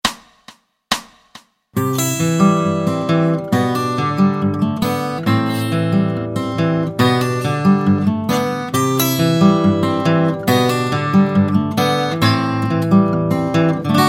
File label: Travis Picking; “solo interlude”